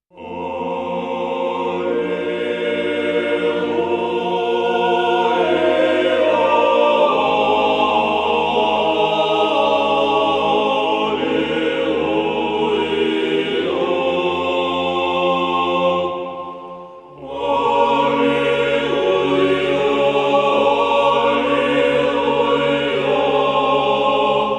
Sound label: Alleluia (Georgian chant)